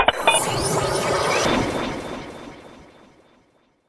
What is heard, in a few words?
teleporter ready